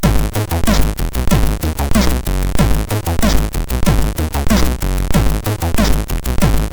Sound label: Bassaki, MOS6581R3; sid